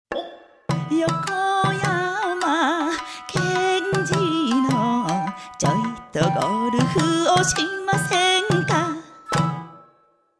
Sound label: jingle